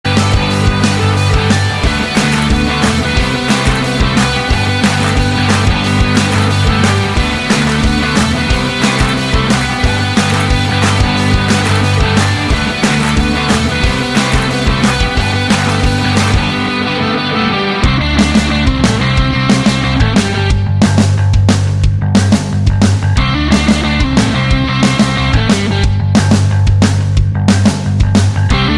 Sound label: happy rock